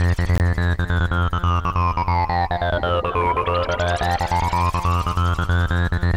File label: sound goa